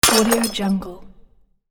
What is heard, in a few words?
breaking plate